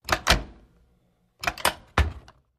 Emergency Exit Door, push bar

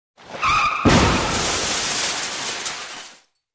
Crash Skid Imp Shatte

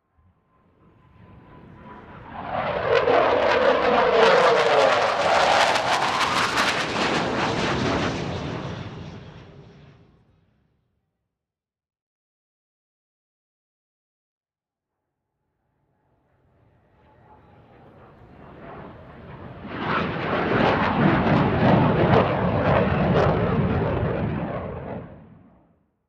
Plane F16 OH Jet

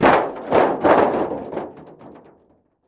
Car Hood Drop On Groun

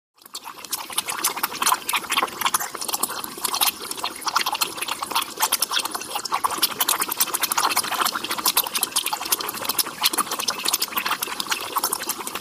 Chemicals Boiling, test tubes

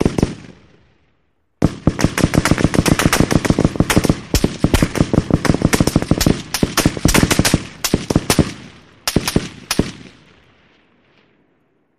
Artillery War Seque

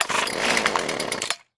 Chain Saw: Cord Pull, False Start; chainsaws

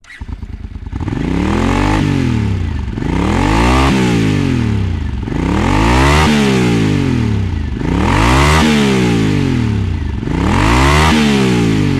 Motorcycles Honda Dr

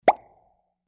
Bubble Pop Standard; bubbles

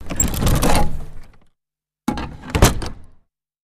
Carriage Door, carriages

